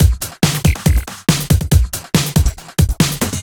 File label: Break Beats, 140 BPM